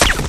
lazer rifle

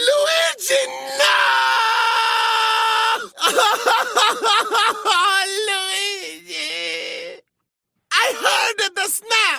nooooo; luigi

